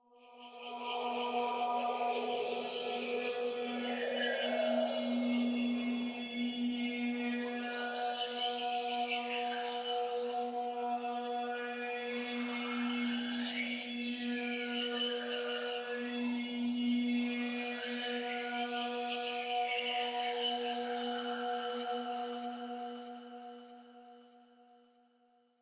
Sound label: Choir; Warframe; DE; Sentients